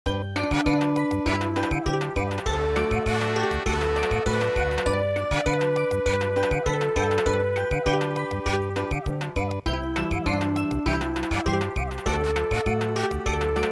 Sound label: Samba, midi